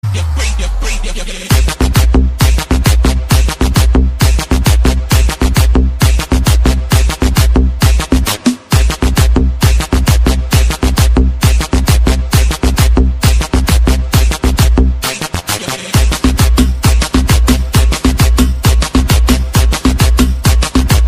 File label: arabic ringtone